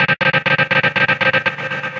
Pong, Synth Rhythmic

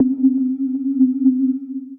Ghost Lead; Synth Lead; Ableton; Factory Packs; Beat Tools

